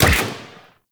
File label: Rifle Fire; Warframe; Venus; Venus Elite Spaceman Leader; Supra